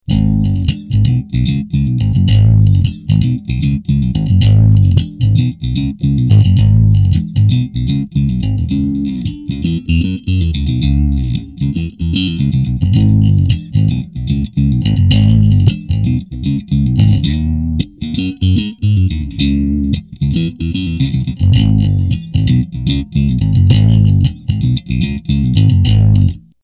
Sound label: riff